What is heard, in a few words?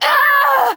Falling; paladins; Kinessa